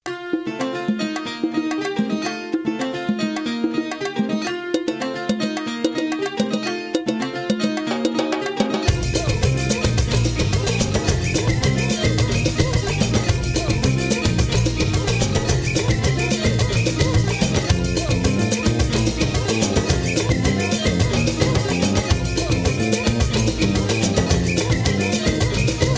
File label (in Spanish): CUBANO